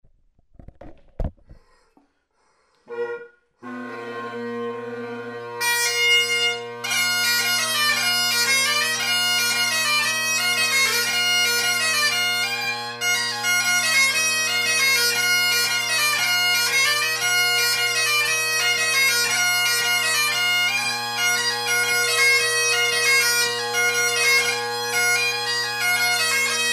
Jigs, bagpipes

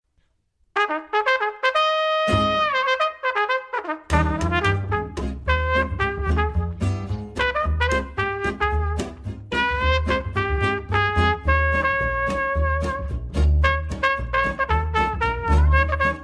Trumpet banjo upright bass

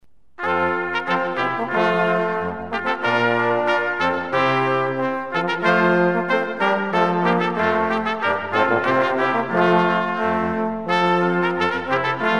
Ballet brass quartet; Classical Quartet

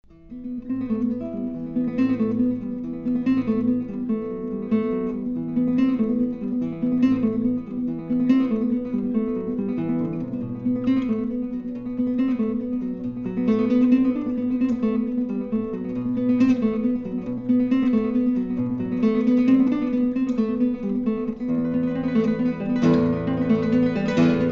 Spanish Guitar; classical guitar

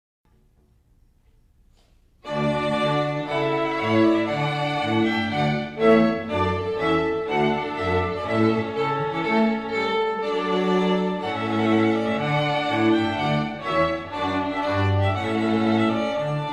string quartet

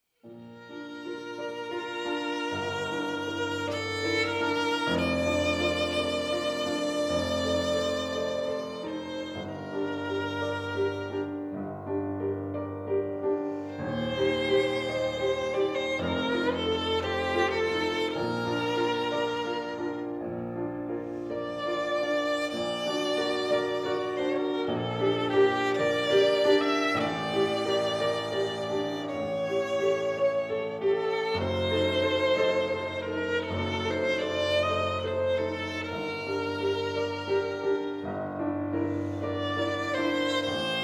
violin

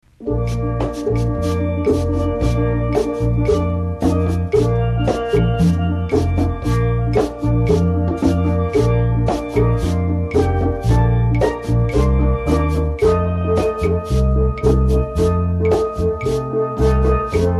Pan Band

